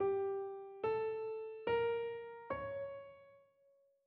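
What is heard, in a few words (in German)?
Tetrachord Span2 g auf, spanisch; Tetrachorde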